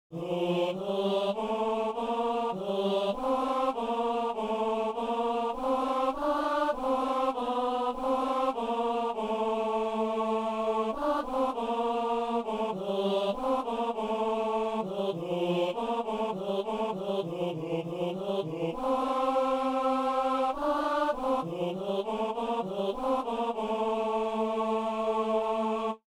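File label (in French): ténor; les anges dans nos campagnes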